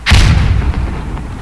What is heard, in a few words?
air exp; observer